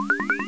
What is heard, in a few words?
loop